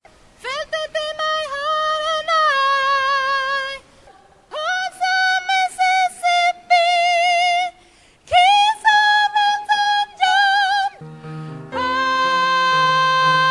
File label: tenor